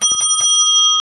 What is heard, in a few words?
ding